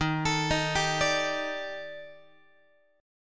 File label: D sharp Major 7th Flat 5th D sharp Guitar, chord; D#M7b5; arpeggiated; x,11,12,13,11,13; 4A,4E,5A,5D,5Gsharp,6Csharp; chord shape